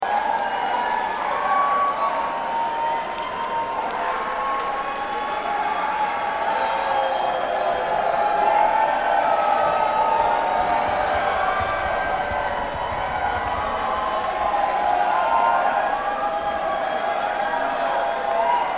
Crowd

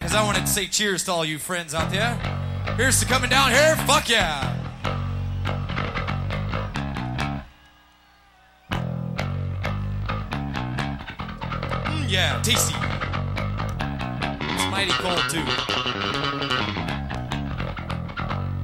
Solos Bass Guitar

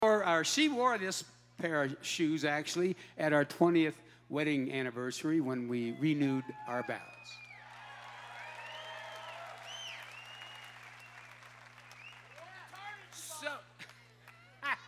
Guitar Talk

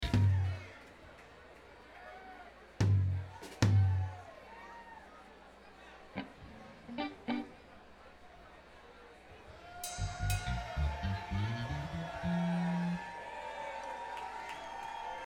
tuning

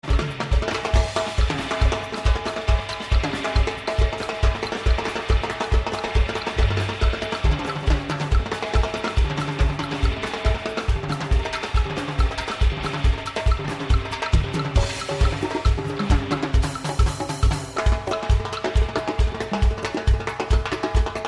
Percussion Jam

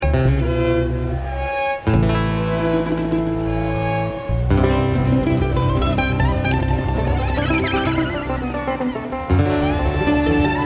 Traffic Jam

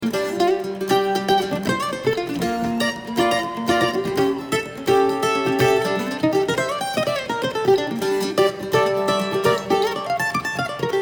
Kentucky Mandolin

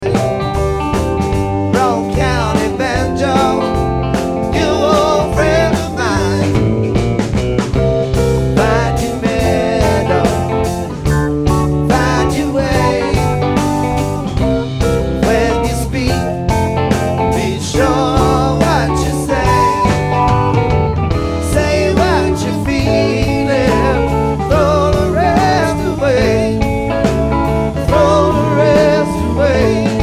Banjo

